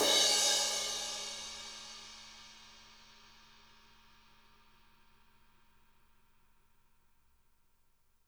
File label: crash, crashes